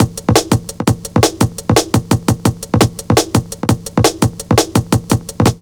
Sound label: 170 break, Explosive Jungle; USB Soundscan vol.05; AKAI